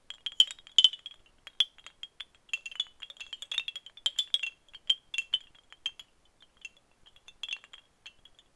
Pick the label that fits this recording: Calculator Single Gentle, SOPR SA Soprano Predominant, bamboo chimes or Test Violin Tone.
bamboo chimes